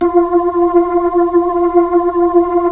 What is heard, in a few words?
B-3